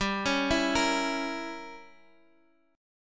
G Diminished 7th, Guitar, Half Step Down

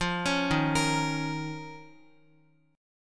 Eb 7th Suspended 2nd, Guitar, chord; x,x,5,6,3,6; Eb7sus2 arpeggiated; JGuitar

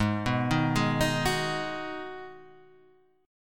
Ab Minor 7th, chord; arpeggiated; JGuitar

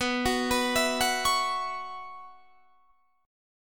B Suspended 2nd Suspended 4th, Guitar, Standard; Bsus2sus4 arpeggiated; JGuitar

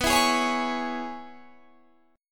C sharp Minor B, guitar chord; C#m/B; strummed; JGuitar

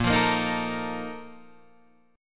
A sharp Major 7th Suspended 4th, Guitar Open G

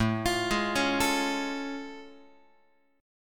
A Augmented Major 7th A Guitar; arpeggiated; x,5,8,6,5,8; JGuitar

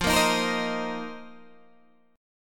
F 7th Suspended 4th F, Guitar, DADGAD; 15,13,13,15,15,13

